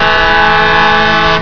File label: Truck Horn